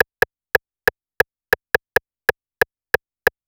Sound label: 808 RIM, Techno 138 BPM; NEW BEAT ERA